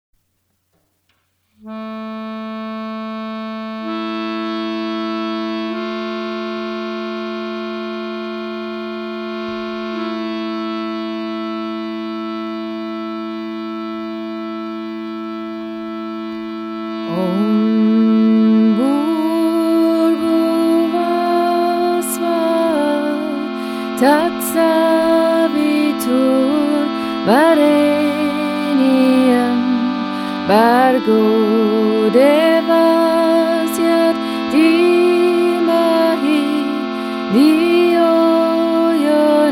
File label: Gayatri